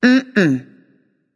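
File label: disagree, female; smite; voicepack; hera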